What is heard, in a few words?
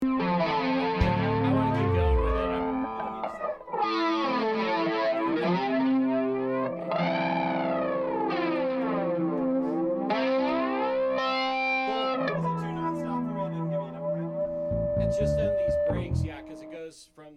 Strings Only